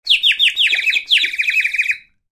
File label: cartoon bird